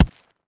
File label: Concrete Chunk Drop; rocks & dirt